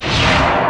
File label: force knockback start